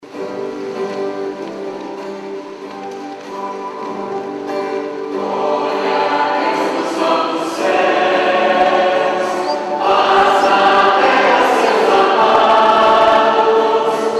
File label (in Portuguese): Gloria a Deus nos altos ceus, cantos